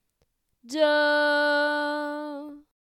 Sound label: sung tonic